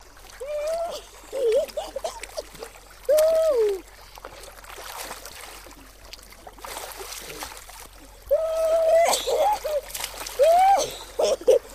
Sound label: Kids Venezuelan Play, kids play